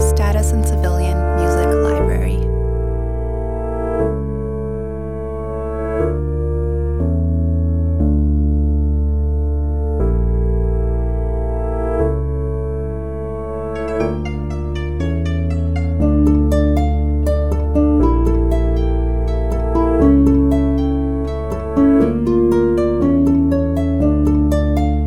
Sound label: drop; oxidation vol 1 complete library